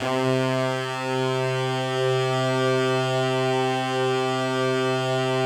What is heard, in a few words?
PAD, DIGI-PADS